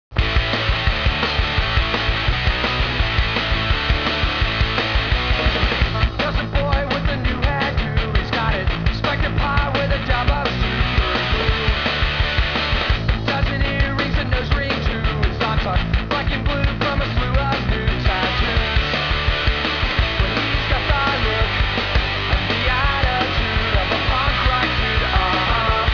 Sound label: punk